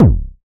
kick drum one shot d key, bass drum sample tuned to the D note